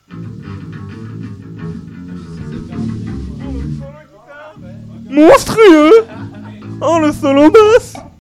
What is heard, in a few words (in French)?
solo basse